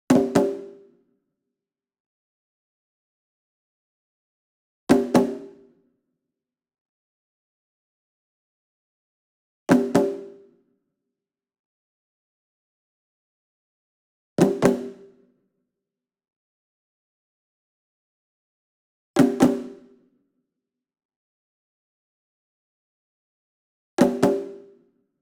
ENSEMBLE DRUM Shime Daiko And Chinese Tom Double Near; boom library; Cinematic Strikes Construction Kit